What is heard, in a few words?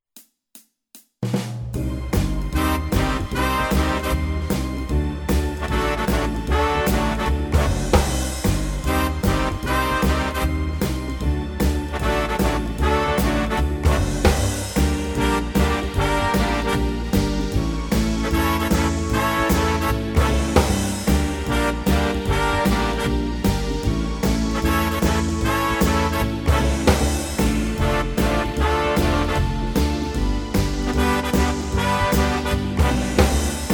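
Big Band, Jazz; Pretty cool…and classy, too!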